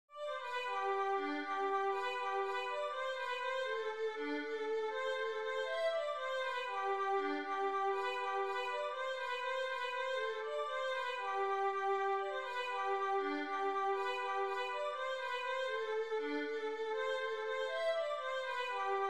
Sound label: irish washerwoman violin